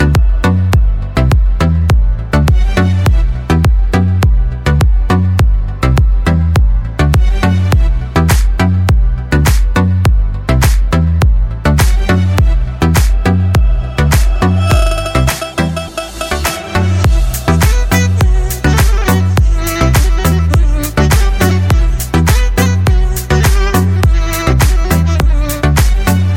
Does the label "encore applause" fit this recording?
No